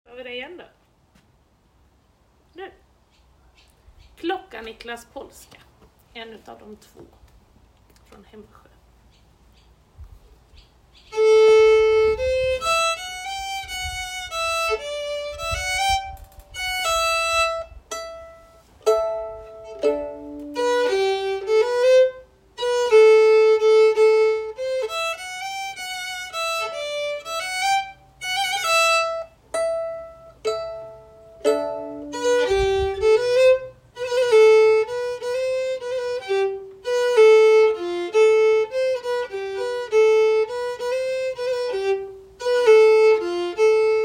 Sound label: polska; Klockar Niklas